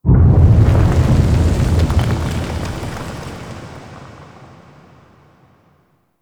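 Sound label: Distant Explosion Debris; Warframe; Ambience; Nokko Colony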